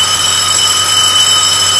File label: alarm shrill; hl2